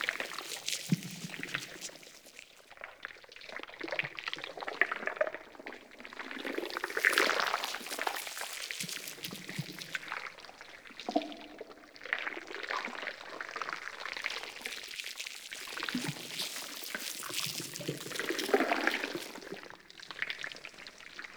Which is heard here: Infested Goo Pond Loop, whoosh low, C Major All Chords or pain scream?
Infested Goo Pond Loop